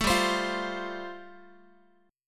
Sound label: G 7th Suspended 2nd Suspended 4th G chord, Guitar; G7sus2sus4 strummed